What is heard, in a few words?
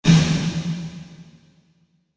only reverb